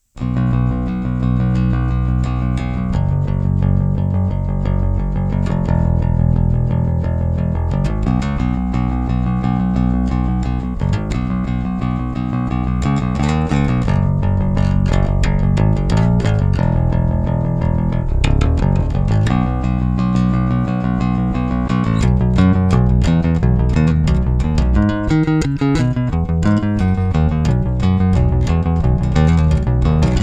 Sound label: Hybrid 5 Punk Mix, basses